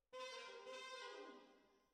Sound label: Tenor, RNR for piano